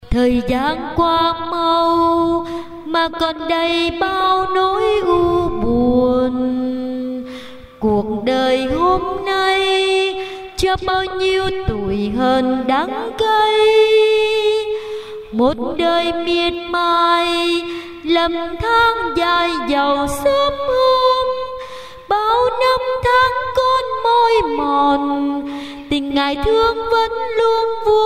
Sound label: alto